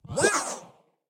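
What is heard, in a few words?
jump; Em Pulo; Super Mombo Quest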